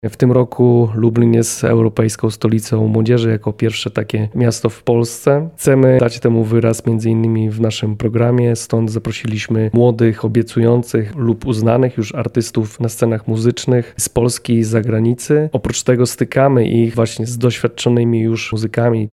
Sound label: jazz; centrum lublina